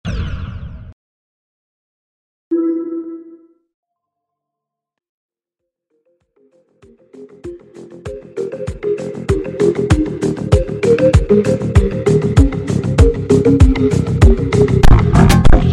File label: Funk ultra Slowed